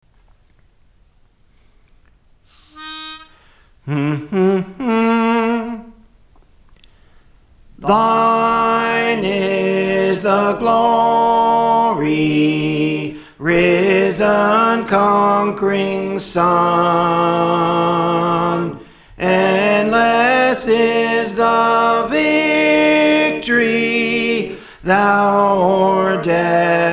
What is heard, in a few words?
Soprano; Thine Is the Glory; Praise For The Lord Training